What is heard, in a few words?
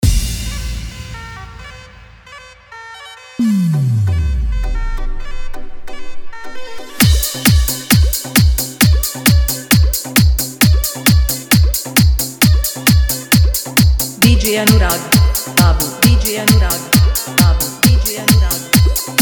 Bhojpuri Hard Dholki Bass Mix